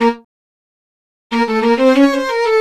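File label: 092 South Hip Hop String Line Bb minor; Strings; Pro Tools Essential Loops; Digidesign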